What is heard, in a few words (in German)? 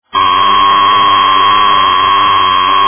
hanhart, armbandwecker